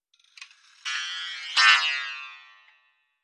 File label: alarm, doppler shifted ringtones